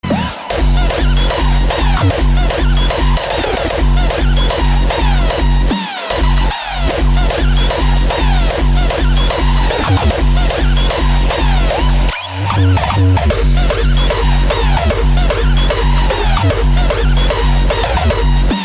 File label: Annoying hardstyle